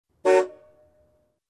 Car Horn once

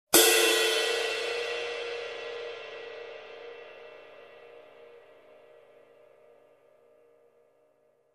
Ride hit on drumset

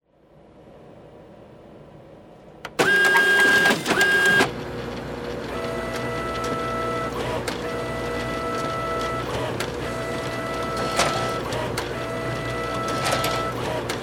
Printer Scanning Sequence

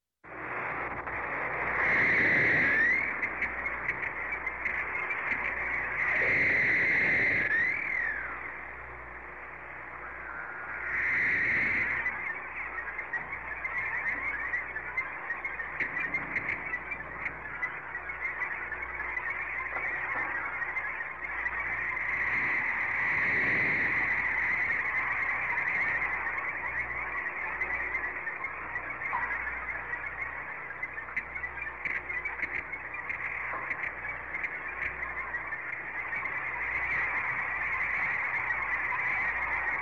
Swamp birds ambience sounds

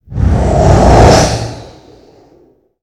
Heavy vehicle whooshing by

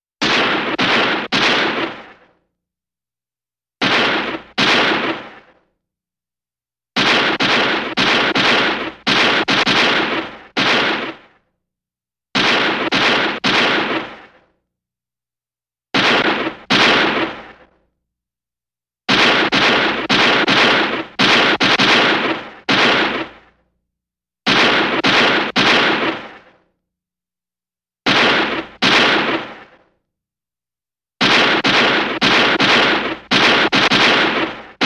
Vintage movie gun shot sound

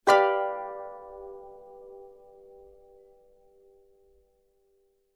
Dominant seventh Harp chord strum

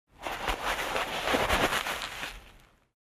Sorting out box of clothes

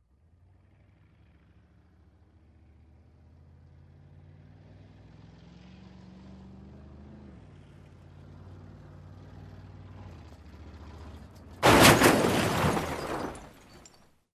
Approaching Car Crash

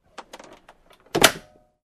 Aircraft Crew Cabinet Door Closed

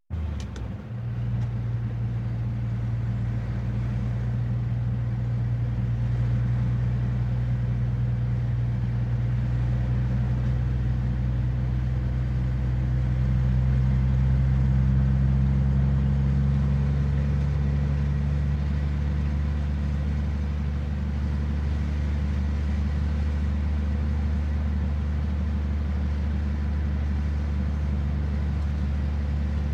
Car Engine Noise

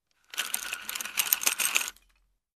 Chainrings rolling

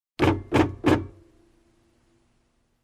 Banging Sound

